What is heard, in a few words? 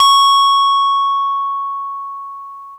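SCARY RHO; RHODES FUZZ; E-MU Producer Series Vol. 8 – Platinum Phatt; EP FX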